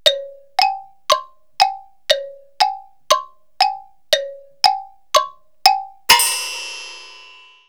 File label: clock rep, music comedy; KABOOM! - Wild and Wacky Sound Software